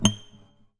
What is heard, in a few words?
Classical Guitar Extended Technique Plink